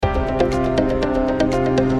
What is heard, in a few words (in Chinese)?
坏人出场